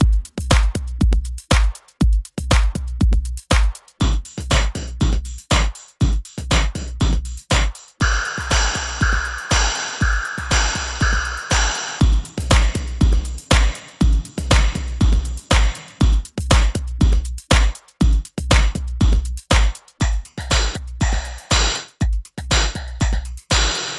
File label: Tuff Beats